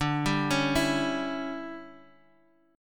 D Major 7th Suspended 2nd Suspended 4th, Guitar, Half Step Down